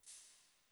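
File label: stereo hat closed, hi-tech kit; Crypton - DrumBase5000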